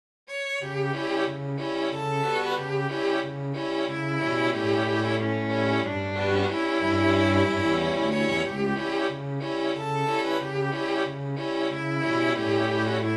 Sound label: String Quartette; Freber; 2nd mvt